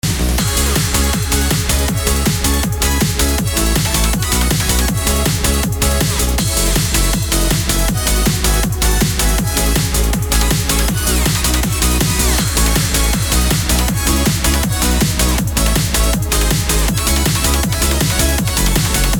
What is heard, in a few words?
inst